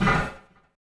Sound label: Motor, Train; OpenBVE